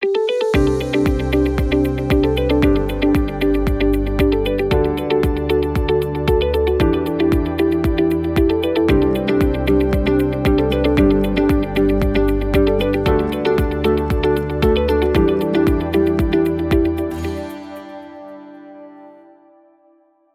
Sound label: motivational ident